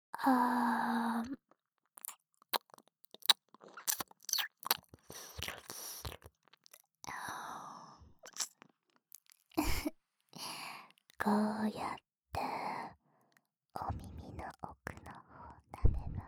耳舐めループ!

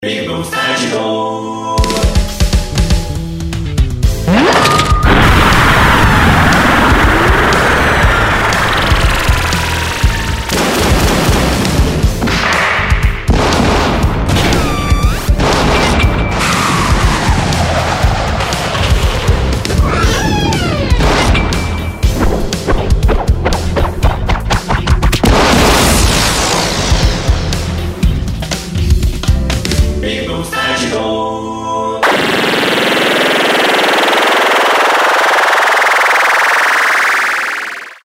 効果音素材集 魔神ロボット; ぴぐみょん; ぴぐみょんスタジオ

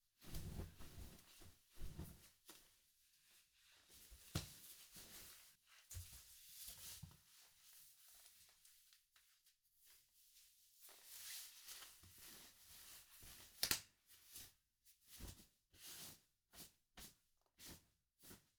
フレアスカートを履く; 3DIO; 色々な動作

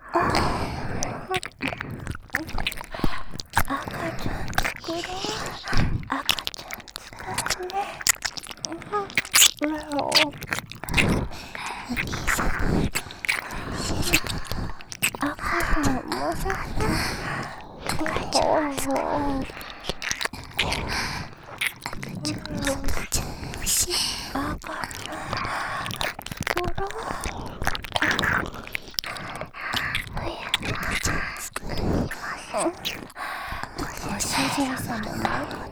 全員 赤ちゃん作ろ♪ 耳舐め

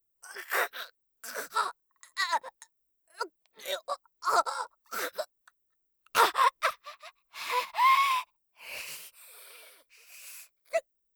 毒薬; 効果音なし

